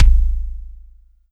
Kawai K1r Rap Kick

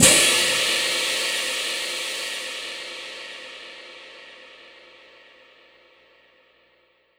Orchestral Crash Cymbals; Korg N1R

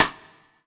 Korg TR Rack Standard Kit Side Stick

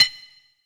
Klank

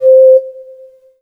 Yamaha TG100 Whistle C5

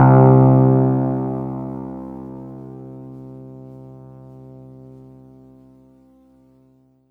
Casio VZ-10M Piano C2

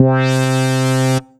M-Audio Venom SH SH SH C3

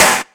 Noise Snare

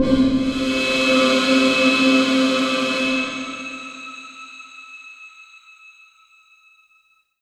Yamaha V50 Suspense C4

